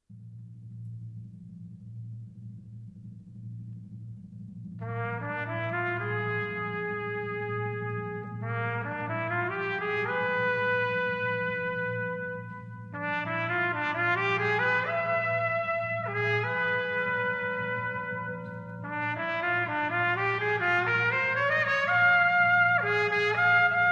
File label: Bass Suite